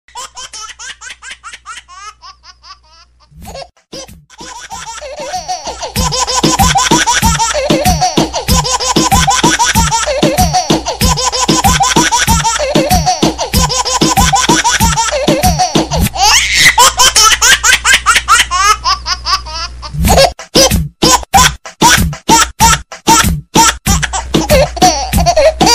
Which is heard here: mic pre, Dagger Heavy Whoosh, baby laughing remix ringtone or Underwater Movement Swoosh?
baby laughing remix ringtone